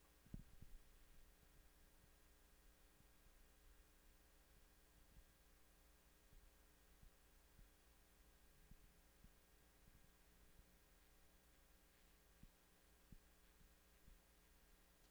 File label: interference; shure